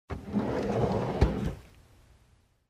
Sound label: Pulling out drawer